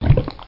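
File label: AMBIENT LAVA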